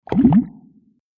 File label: Bubble Pop Gloppy; bubbles